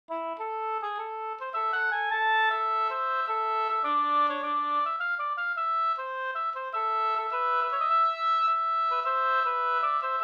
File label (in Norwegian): pols